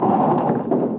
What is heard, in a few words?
door; DAoC